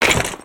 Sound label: Skullken Walk Run; creatures organic